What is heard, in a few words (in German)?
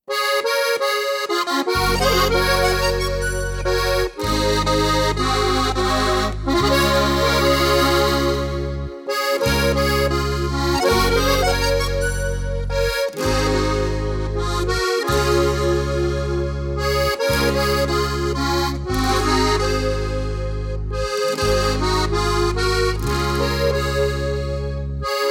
ohne Chor